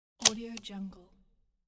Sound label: briefcase unlocks